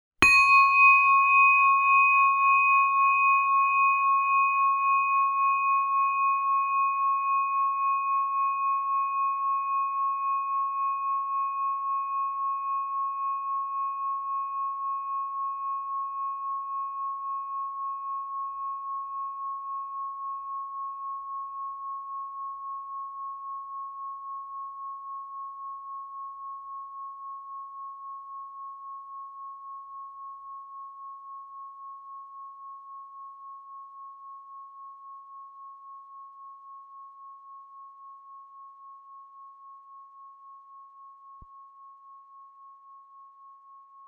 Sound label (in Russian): do-diez 3 oktavy